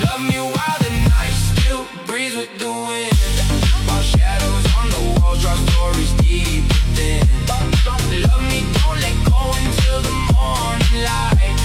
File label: Phonk